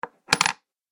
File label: Coin Placed And Dropped On Table; Money Sound Effects